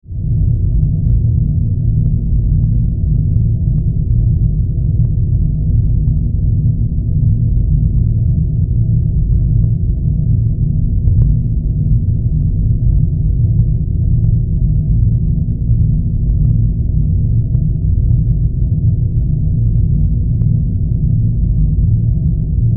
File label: Spaceship Hum, Spaceship, Hum, Modulation; 5.1